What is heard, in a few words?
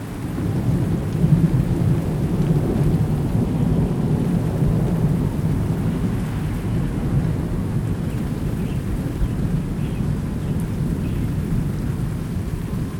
thunderstorm; Golden Crayon Sounds